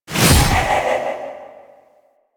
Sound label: Sow Target Hit; Warframe; Wraith